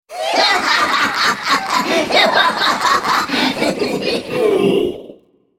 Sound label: laugh; FizzSkin04